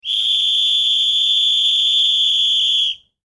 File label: Whistle Blow Long Referee; Sports; Whistles; Objects